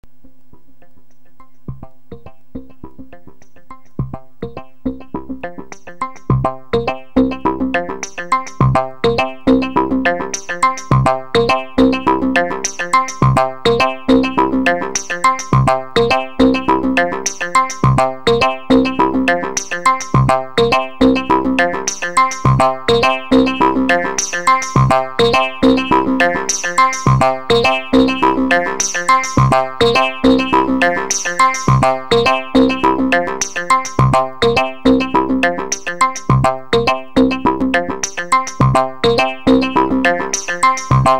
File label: velo to wave